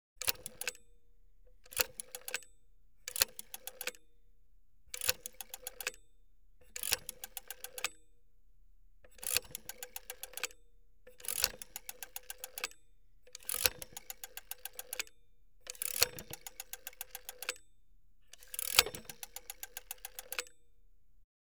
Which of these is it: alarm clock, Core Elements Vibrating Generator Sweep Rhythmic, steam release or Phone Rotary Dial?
Phone Rotary Dial